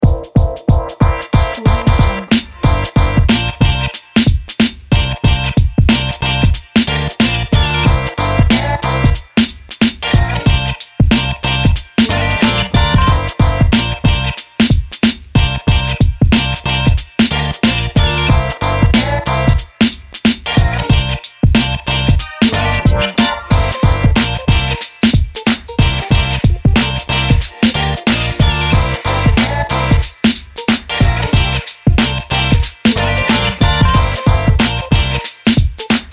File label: action vlog